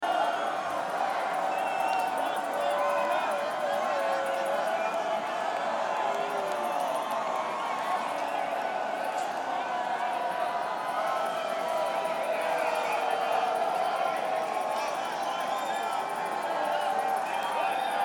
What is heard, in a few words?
Crowd